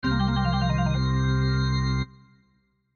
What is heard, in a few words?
Organ Blues Fill; Organ Blues Fill Chord First; Keyboard Sound Effects; Blastwave